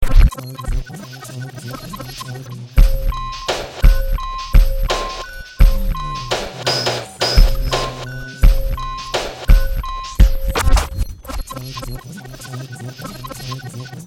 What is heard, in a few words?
Deep Dream (Beats), To an 85 BPM abstract beat, vocals are overlapped as SFX.; V-Synth